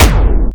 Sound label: pulse